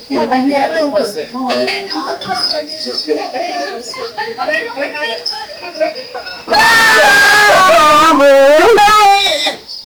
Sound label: a lovely christmas dinner